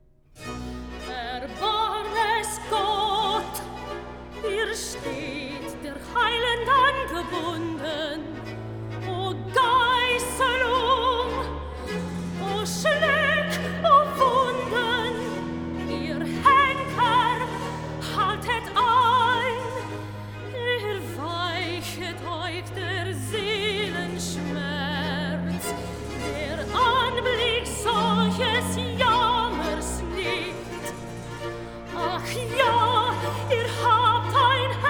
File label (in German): Recitativo Erbarm es Gott; Bach Matthew Passion